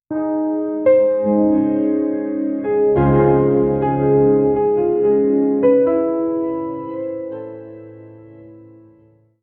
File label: emotional cinematic track, ambient-textures